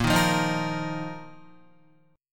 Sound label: A sharp Suspended 4th Guitar; strummed; A#sus4; JGuitar